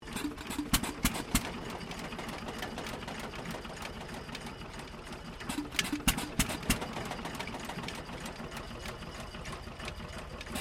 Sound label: ENGINE HIT AND MISS OPERATING, Simple combustion engine; Bruts And Vanderbolt; Machinery; Machines